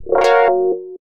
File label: alert, notifications; Android